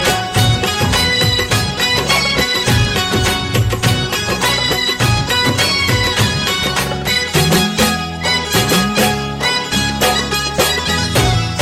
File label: Rajasthani Instrum